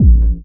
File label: KICK R; KICKS; AKAI; USB Soundscan vol.20 - Fresh Disco House I